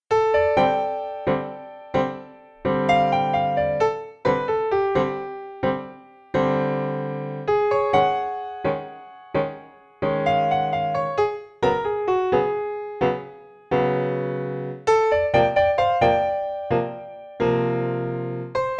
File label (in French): Modern Binary Jazz, Piano seul